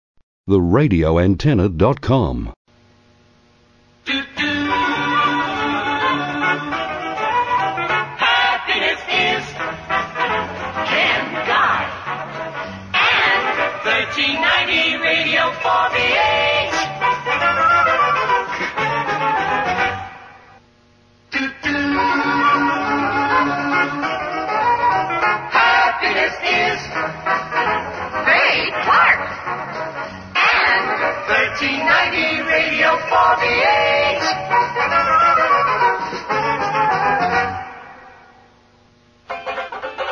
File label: Jingles; Aircheck; 4BH; 1960s